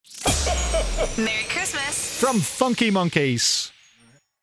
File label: Xmas Merry Xmas Stab; FM Radio; Xmas Jingles